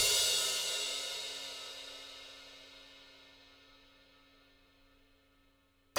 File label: Music Down Beat Perc; GnomeGnight